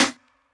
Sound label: snare; dough-samples; eddyflux